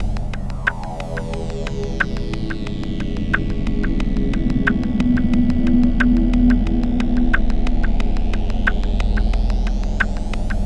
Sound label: vibes